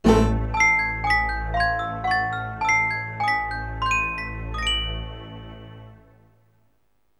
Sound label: Door; Luigi's Mansion; Chauncey's Door